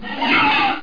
boar atak; landslor

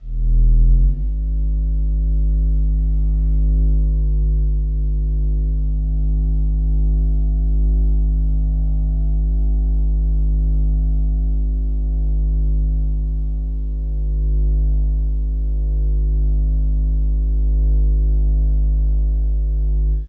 scan; The Ultimate Human Body 2.0; Dorling Kindersley

